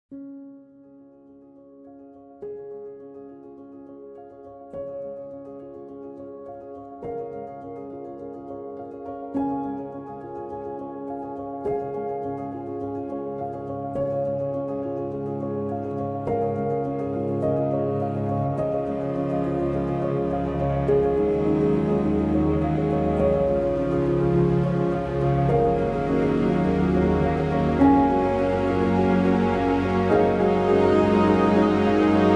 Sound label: Tropical Rain; Terra Nil